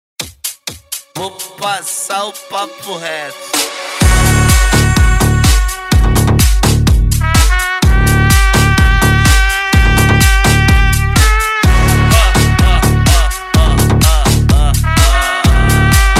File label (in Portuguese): mega funk